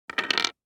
DICE on Hard Wood, Drop, Standard, 6 Six Dice; Samples; ArticulatedSounds; DICE Sfx Party (Extended)

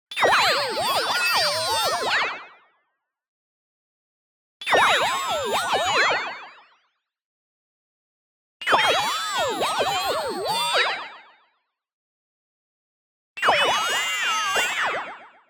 TONAL Chirping Fuzzy Freeze; boom library; Anime Essentials

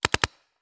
Paintball Gun; Sound Ideas; Guns

